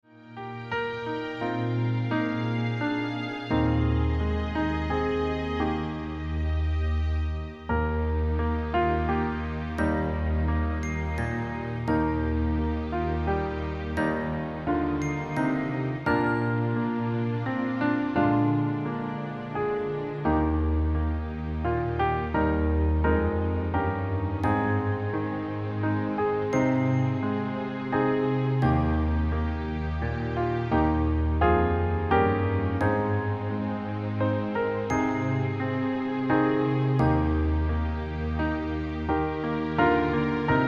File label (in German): Stille Nacht Eb Dur